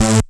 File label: Synth Sound; Vengeance Samples; VEE Synth Shots